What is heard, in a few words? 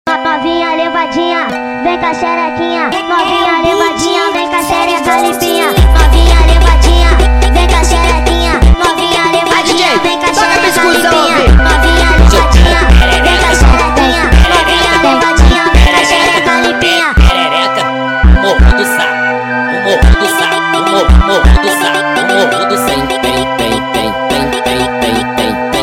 sped Up; funk